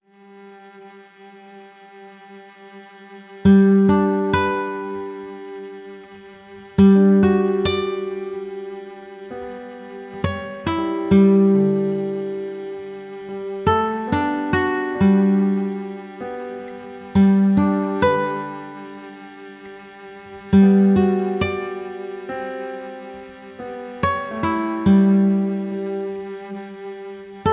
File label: Music End Remembered; Passpartout The Starving Artist